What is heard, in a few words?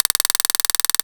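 Metronome Click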